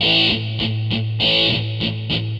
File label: GUITAR, ROCK POP; MAGIX